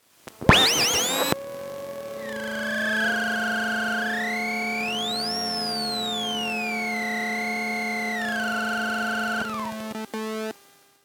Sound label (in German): pkw, elektrosound